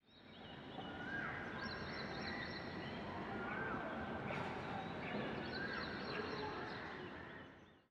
ambient battle; World Of Warships

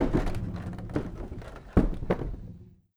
door large wood close end; Rust

